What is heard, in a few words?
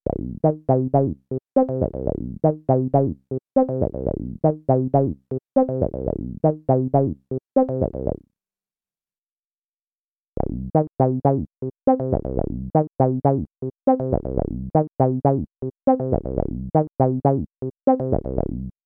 SAW; ABL2 versus ABL3